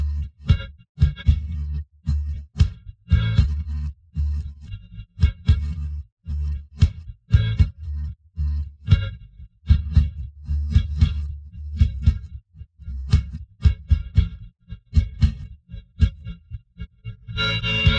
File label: drums; SiSEC11